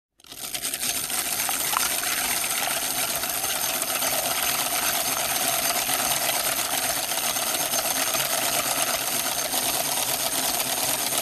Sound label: Manual Grinder